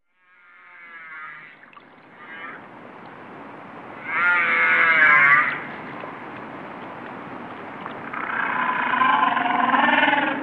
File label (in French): LE CHANT DES BALEINES